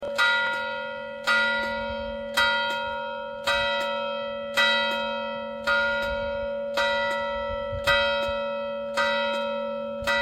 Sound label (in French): CLOCHE FIN, EGLISE; LOIZE 79